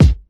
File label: original kick single shot a sharp key